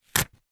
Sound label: foley cheque rip from book stub